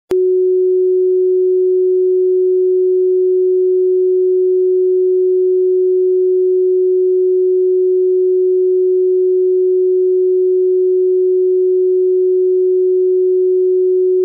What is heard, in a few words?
Frequency 369 Hz